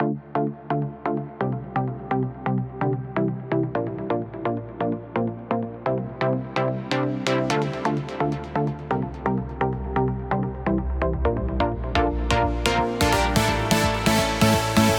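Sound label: Soft Chord Loop - 128 BPM D minor, Synths; House; Chord Loops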